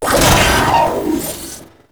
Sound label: Auto Turret Open; Venus; Turrets